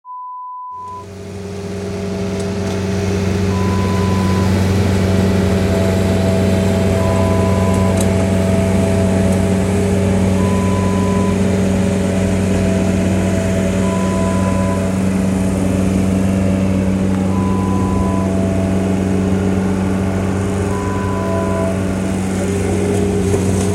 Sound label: Ride on Mower